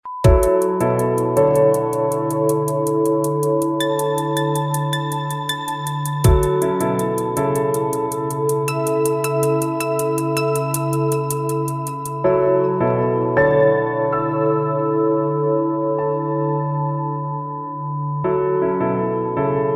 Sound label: air groove